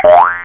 BOING; white rabbits demo

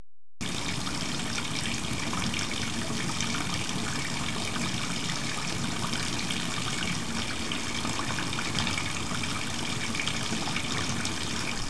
WATER

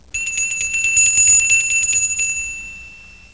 bell ding ding ding, percussion instruments

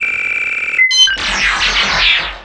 robot start; Earth and Beyond; EA Games

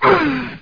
Die; gubble

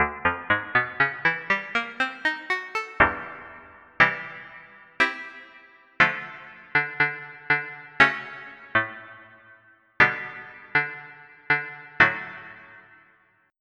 FM Stab; Onj's Soundfont

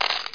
money; CD Action 47a 2000

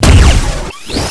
railgun; Chopper2K; Revista CD Expert 45

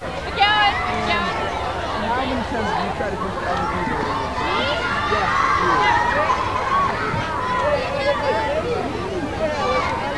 crowd; Mr. Wonder's Greatest Toyshop on Earth; OmniMedia

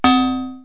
SPACE CHIME, STRANGE; KABOOM! - Wild and Wacky Sound Software

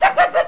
DOG

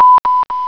shark; spectrew

